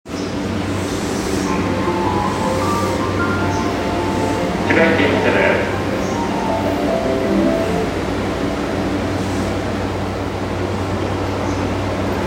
JR横須賀線武蔵小杉北, train sounds

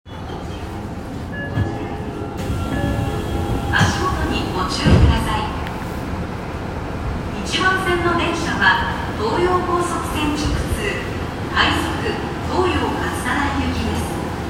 東西線落合東

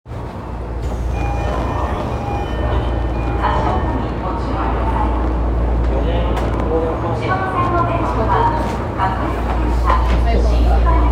有楽町線市ヶ谷東, train sounds

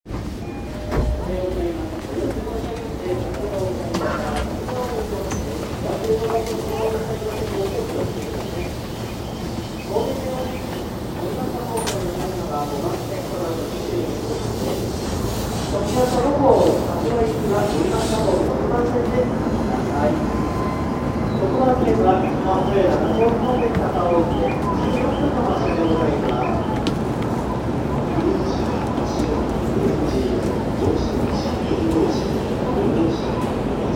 JR中央線快速立川西, train sounds

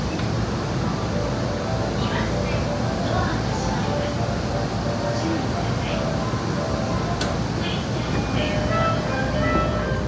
京浜東北線王子南, train sounds

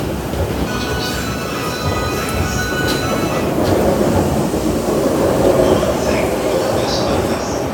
JR中央線神田東, train sounds

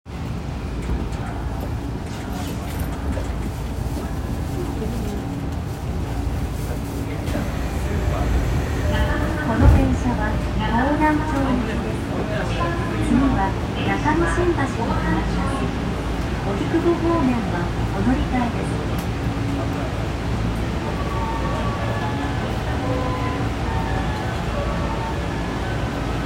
丸ノ内線中野坂上西

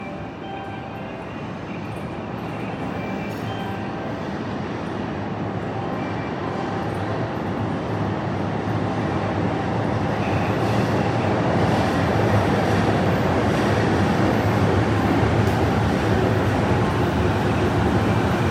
JR川越線大宮西接近, train sounds

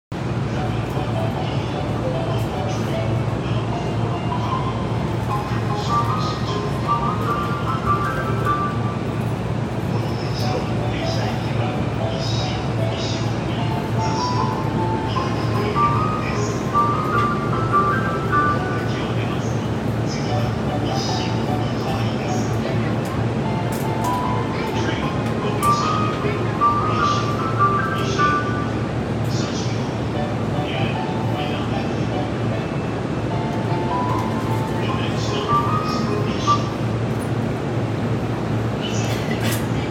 JR埼京線大宮南, train sounds

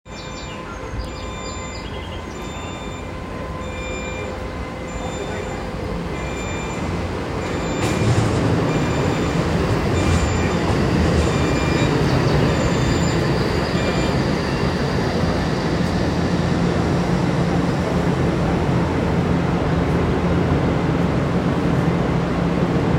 小田急線海老名西東接近

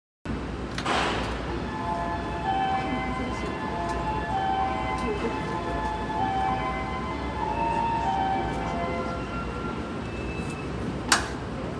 宇都宮線 尾久 南, train sounds

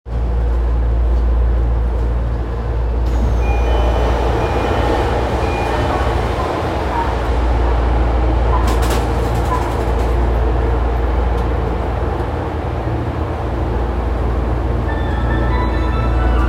有楽町線飯田橋東, train sounds

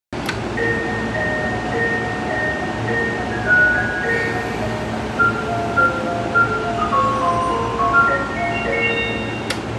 京浜東北線蒲田南, train sounds

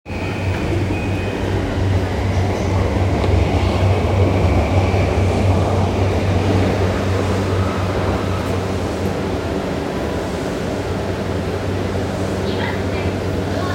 JR山手線駒込CCW

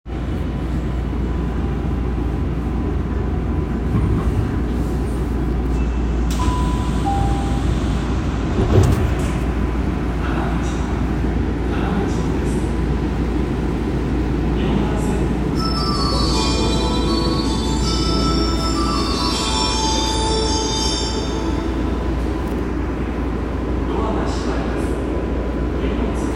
副都心線要町北, train sounds

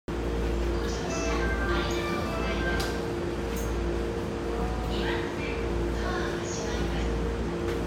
JR武蔵野線三郷東, train sounds

